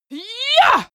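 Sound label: female combat attack short, screams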